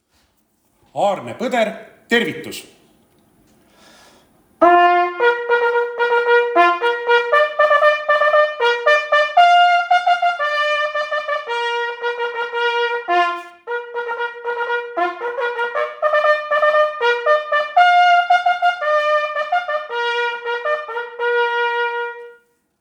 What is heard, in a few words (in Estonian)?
tervitus, Jahisarve võistluse signaalid